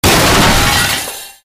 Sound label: grinder bot, ambient; Garry's Mod; tf2